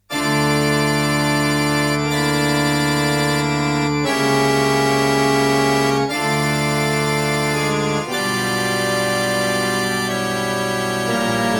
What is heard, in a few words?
preludia organ